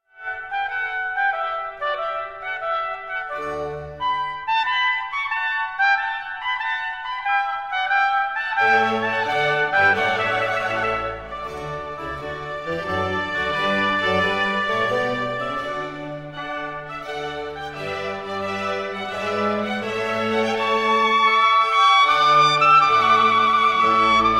concerto for trumpet 2 oboes and strings in d major